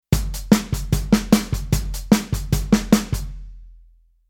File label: Rhythm Rock n Roll